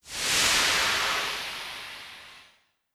Tank Steam

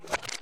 Purple Lever Off

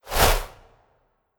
Impaler Earth

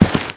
Stone footstep; starbites

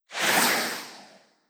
Ghost Run

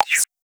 Jump

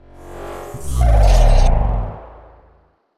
Skill Jamming; starbites

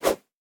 whoosh

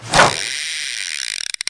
fishing pole throw; MiniGames

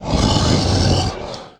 Monster Breathing; Ralph

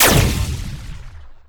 Short Burst; U L Short Burst 10

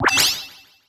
Activity Monitor Activate

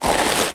Sand footstep

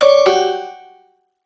Sfx Wintergames

